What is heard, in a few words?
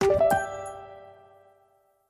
wood chip minor